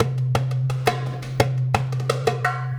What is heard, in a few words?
Tactile Impulse, Processed Drumkits